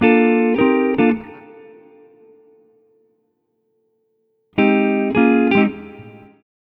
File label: Multi Guitar Swing Gtr-A#, 105; Zero-G Kocktail Kollection; Construction Kitz (Lounge)